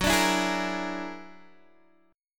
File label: Gb Augmented 9th Gb down Guitar DADGAD